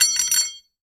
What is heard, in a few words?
Aluminium Tube Drop